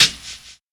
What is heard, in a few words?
Shake Place; FREE SFX PACK VOL 2